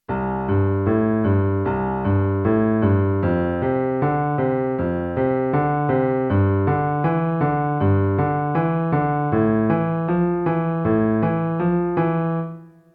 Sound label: boogie bass c f g am